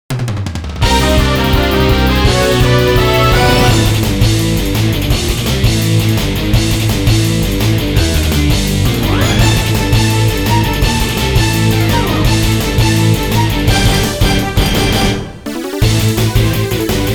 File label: Revolver Battle; Eiyuu x Maou